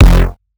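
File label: Lead F sound Bass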